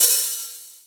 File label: Open Hihat; Cymbals; Mutekki Media; Vengeance Electroshock Vol.2